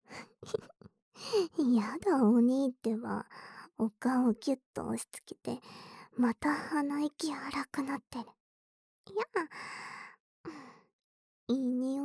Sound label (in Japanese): 【左】耳ふ～, 耳かき, ASMR; 耳かき小分けトラック